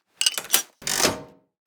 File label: Rifle Laser Gatling Reload Mag In